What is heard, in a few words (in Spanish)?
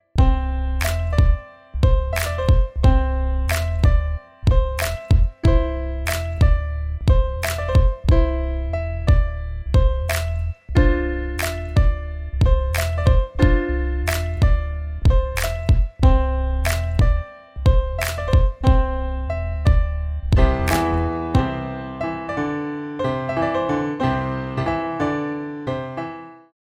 INSTRUMENTAL; ARTEMISA; radio3 raportajes